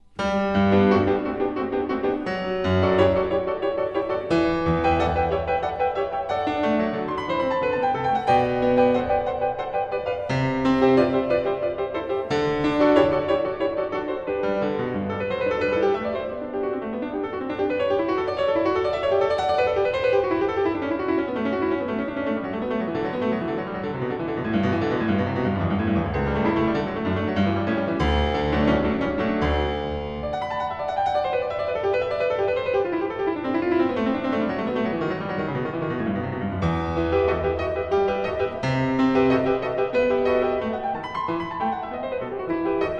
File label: Bach Goldberg Variations BWV988 Variation 29 - Brillante